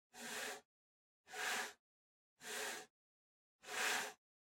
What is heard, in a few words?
PC-Mouse-Move (Far-Hard Pad-Mid)